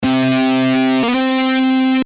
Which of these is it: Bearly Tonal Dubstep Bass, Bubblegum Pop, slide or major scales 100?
slide